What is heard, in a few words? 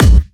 clear steel kick drum a sharp key; kick drum samples